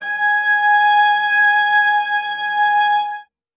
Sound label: string acoustic, cello